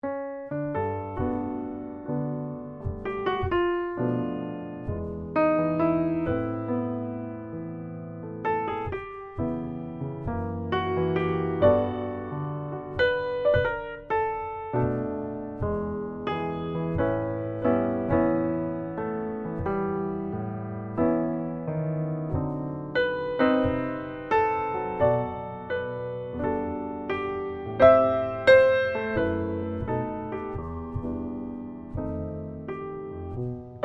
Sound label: solo jazz pianist